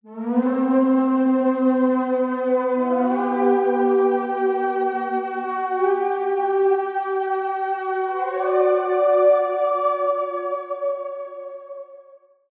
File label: gloomy lament